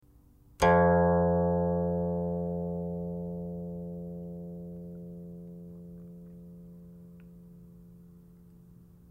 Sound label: guqin; chuYinKouXian